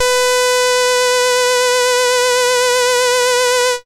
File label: SID lead B; ChipShop Deluxe; ChipShop Hits